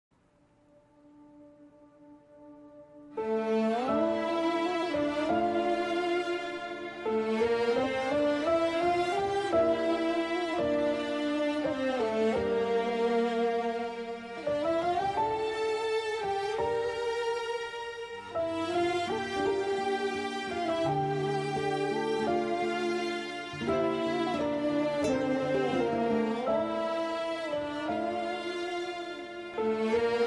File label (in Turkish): Enstrümantal fon müzik Jenerik Slow; Babamın Günahları